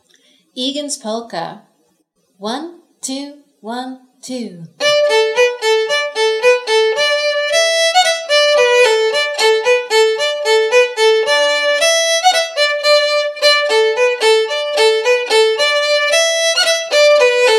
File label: Polka; StrawberryFest Parade 2014